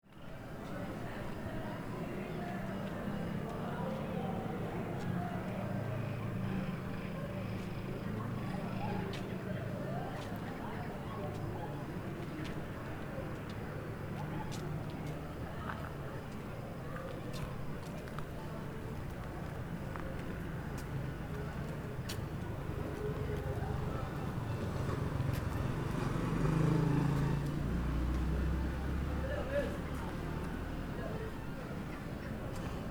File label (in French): nefta terrasse hotel soir; resonances oasiennes